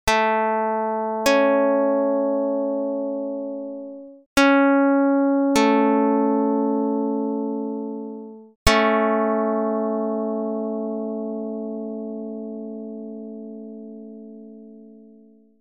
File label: pluck; dr220